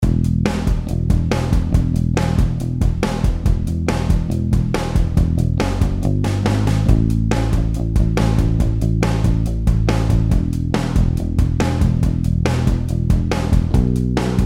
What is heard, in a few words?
blues ride rhythm drum bass 140 BPM